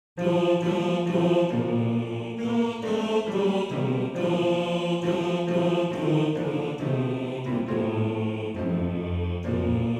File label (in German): Bass; Bass 2